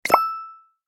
Sale pop up purchase alert

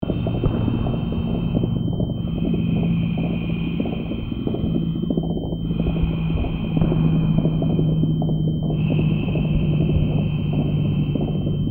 Flashbang explosion wounded soldier perspective

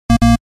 Arcade 8 bit death

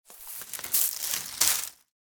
Twigs and foliage crunch; forest

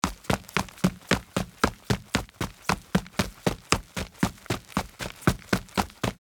Footsteps running on dirt jogging fast

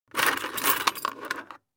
Picking up from a jewelry casket

